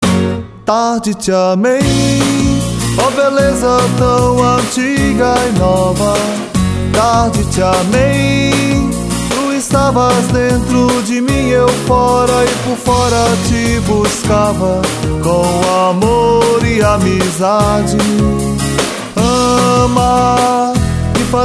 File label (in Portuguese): Refrão